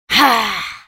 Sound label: spell cast effort, en US; Elise